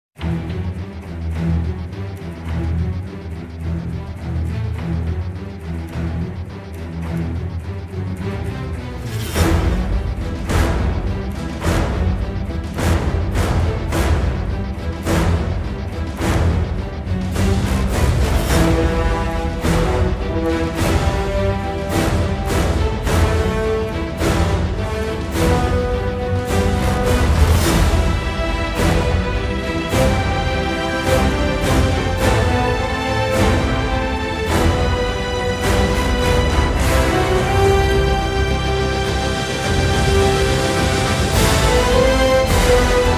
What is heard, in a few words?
Battle Front (No Choir), Epic Builds And Action; Brand X Music